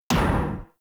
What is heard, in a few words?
Pulse Laser Mech; Warframe; Railjack; RailJack Weapons